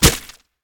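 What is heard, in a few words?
arrow impact; smite; ahpuch skin10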